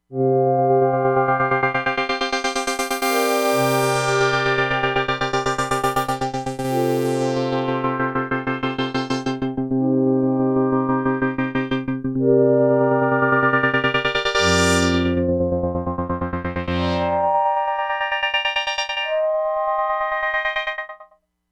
DYNAMIC DIGITAL REPEAT, Crumar Bit 99; Factory Programs

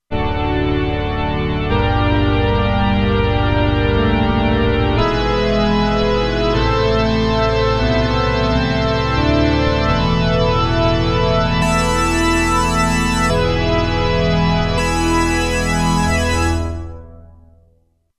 Pipe Organ, Roland Alpha Juno-2; Original Sound Chart patches

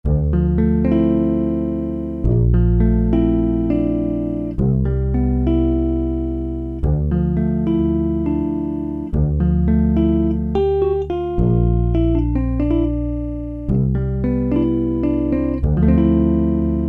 Flange Pan

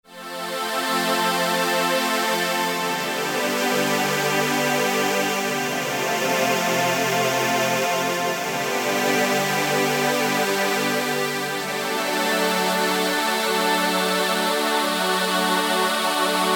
Thin Obie String, Novation XioSynth 25

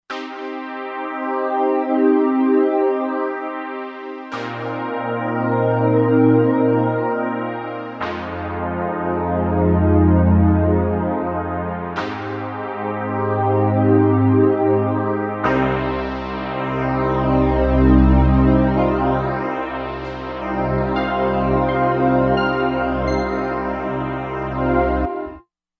Moving, synth

